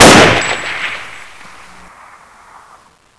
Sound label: aks fire, weapons; svencoop